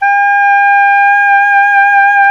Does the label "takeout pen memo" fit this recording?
No